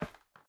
Step Wood; MiSide

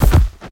Step Enemy Boss; Game rip; MiSide

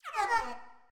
Safe Lock; Game rip; MiSide

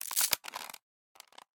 Ramen First Open; Game rip; MiSide

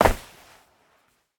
Player Fall; Game rip; MiSide

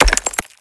Break Shot; Game rip; MiSide

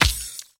Creepy Hit; game audio; MitaCreepy; MiSide

